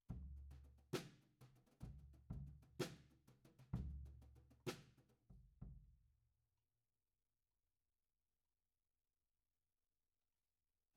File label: Floor, 96bpm